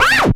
knopka cifrovoi rezkii visokii blizkii

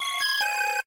srochnaya informaciya na telefone

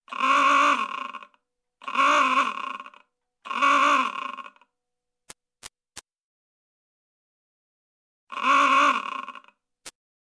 zvuk klaksona gudok signal

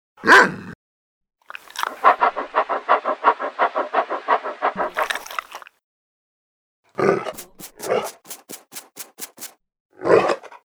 zvukovoj effekt sobaki vizivayushej diru chtobi est myaso

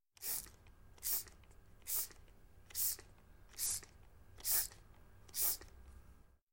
cveti polivayut pshikalka

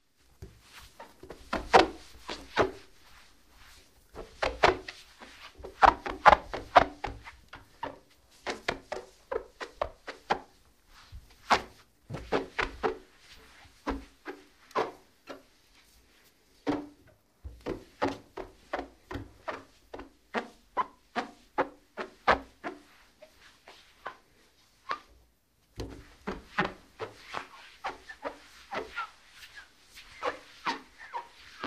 mityo okna gubkoj

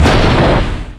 pushechnij vzriv

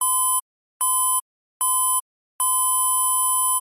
pisklyavij nadoedlivij budilnik

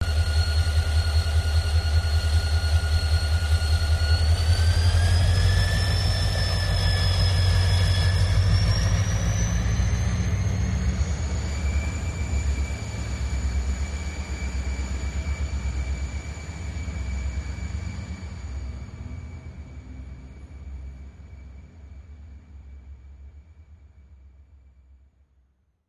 zvuk dvigatelya poezda poezd otezzhaet

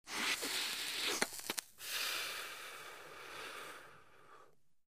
zatyagivanie sigareti tresk zhara vidoh

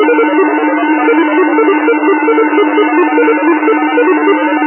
zvuk iz kompyuternoj iri

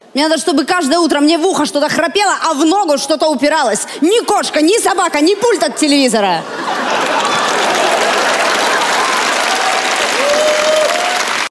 v uho chto to hrapelo a v nogu chto to upiralos

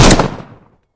predupreditelnij vistrel v vozduh

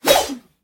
moshnij zamah dlinnoj sablej

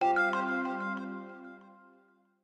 melodichnij spokojnij zvuk

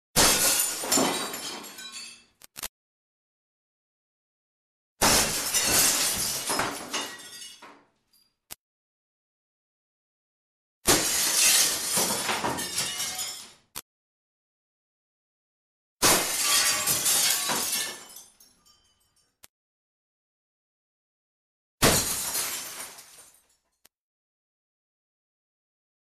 razlichnie varianti razrusheniya stekla

